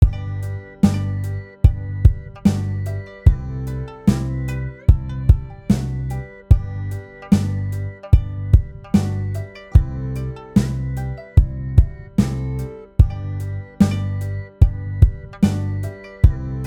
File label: Instrumental Arrangement